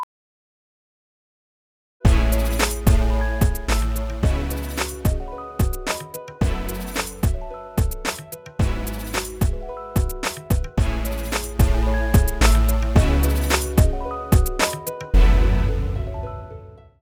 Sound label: Music; Branding15 Divas; TV ONE BRANDING